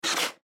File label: Leopard Blow Air, Leopard Blow Air Out Nostril Breathe; Big Cats; Big Cat Sound Effects; Animal